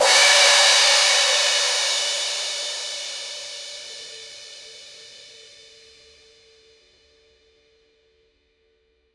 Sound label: high reverb loud orchestr, percussion